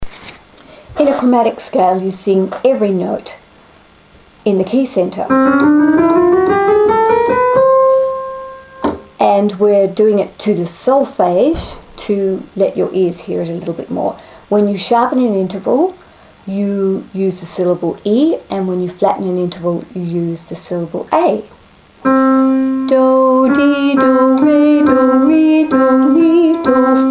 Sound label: Chromatic scale to solfege syllables, Singing Exercises